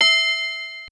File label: Bell E6